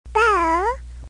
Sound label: ball